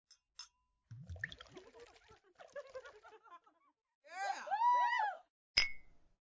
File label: shots